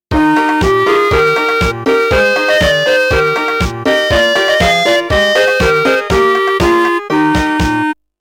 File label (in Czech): melodie